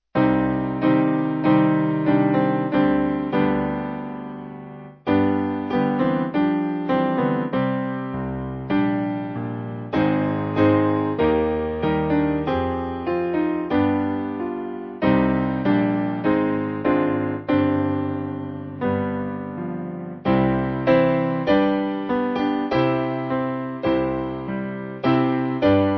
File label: Piano; 4/C Slower